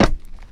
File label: metal solid, footsteps